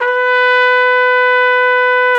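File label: BRS AKG TP, Brass Trumpet p; Roland L-CDX-03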